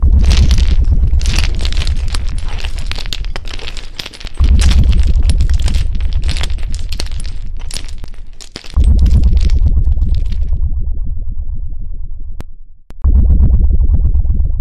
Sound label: rumblings